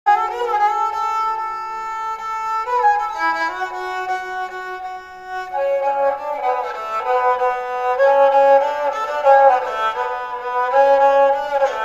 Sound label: rebec